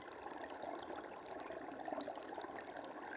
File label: Waterway E Light